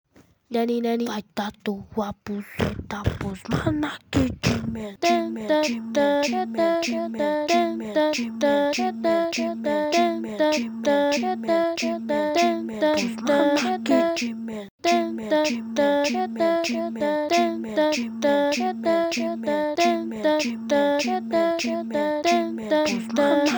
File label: phonk